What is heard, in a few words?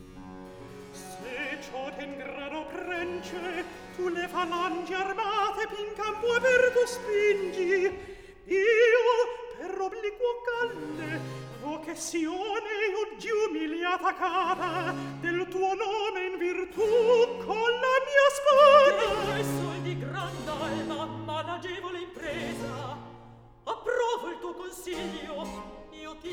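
Recitativo, Handel opera; Act 3; Se cio t'e in grado, O Prence; Rinaldo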